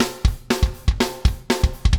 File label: Double Time Beat, Rock Drums